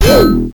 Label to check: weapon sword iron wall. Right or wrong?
Right